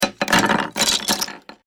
Wood Debris Foley Small Pieces Falling On Wood Floor; Materials; BBFX